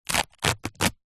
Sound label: foley clothes running shorts polyester rip